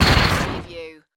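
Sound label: Production Element Hit Distort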